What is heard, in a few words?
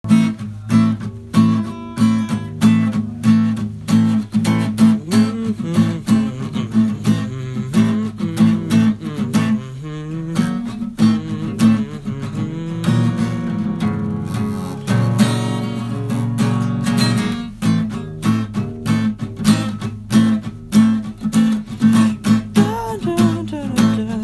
opening melody; Dark cabaret meets musical theatre meets rock